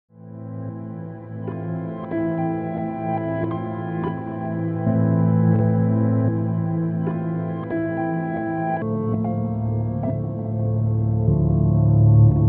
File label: Mood Mk2 Piano Slip Tape